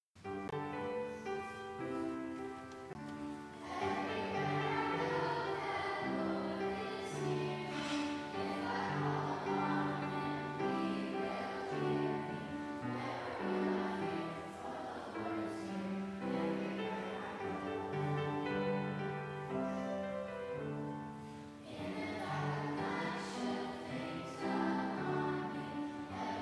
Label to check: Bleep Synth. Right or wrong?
Wrong